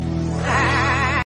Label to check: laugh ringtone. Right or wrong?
Right